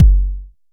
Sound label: analog tonal, Kickbank; Ableton Foundation FreePacks